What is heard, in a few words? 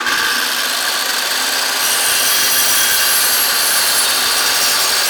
SKILL SAW